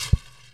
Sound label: Ice Shove